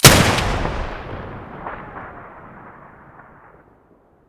muzzle beneli stereo, weapons; m1014

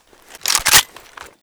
aug misfire; STALKER; IWP

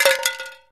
conserva; STALKER; IWP

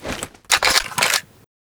rg6 reload, weapons

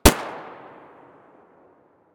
glock17 muzzle mono far

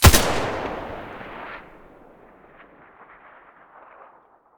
scar muzzle mono

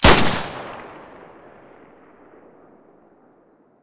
m9a3 muzzle mono; beretta

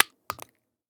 Shell 12ga carpet; STALKER; IWP

